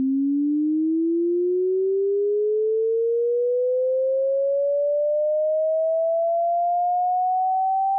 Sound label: FM Sweep